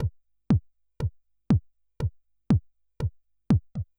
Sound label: House Drum OnlyBD Flanger